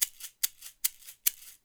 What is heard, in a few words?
Percussion, 144; Sampleheads; NYC Percussionworks; 90 s Sample CDs